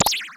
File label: Stomp